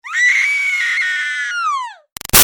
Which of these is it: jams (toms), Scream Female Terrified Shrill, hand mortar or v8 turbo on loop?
Scream Female Terrified Shrill